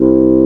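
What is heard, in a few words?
woodwind C1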